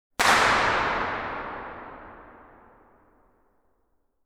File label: Sound Left Transient Attack And Reverberation; Kharma Test & Calibration CD